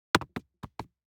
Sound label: DICE on Cardboard, Throw and Roll, Foam, One Die; Samples; ArticulatedSounds; DICE Sfx Party (Extended)